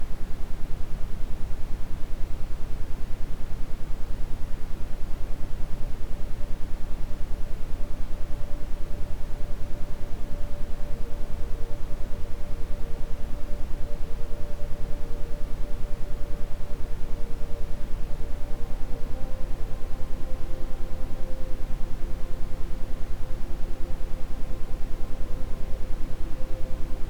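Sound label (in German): Iso Gehirn Pruefungszeit Quickie mit Ambient Sound, Isochrone Töne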